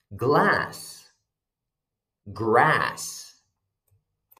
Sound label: glass grass